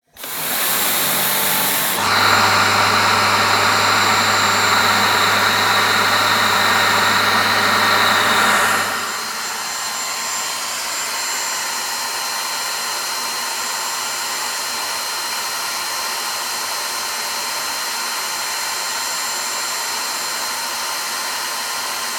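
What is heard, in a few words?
vacuum cleaner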